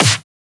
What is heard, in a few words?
Snare; FLPRO; Snares